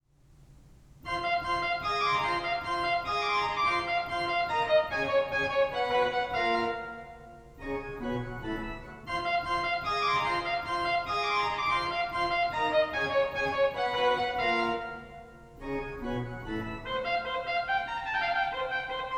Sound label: organ postlude; one page organ prelude postlude